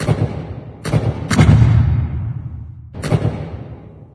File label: inside battle, prison; ambient; source engine; hl2; video games